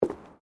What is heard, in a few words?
FOOTSTEPS WOMENS LOW BOOTS BOARDWALK STEP; Footstep Foley; Human